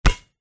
sport basket ball very hard bounce x1 on asphalt concrete